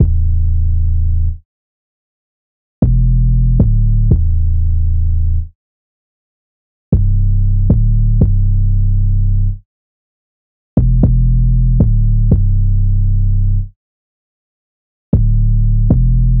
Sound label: 808 loop 117 Am; Slate Digital BASSHOLE Sample Pack